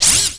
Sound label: ROCK